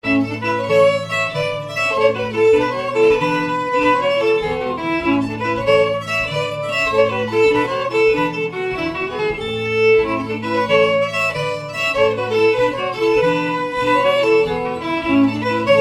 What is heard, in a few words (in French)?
Gigue de Salon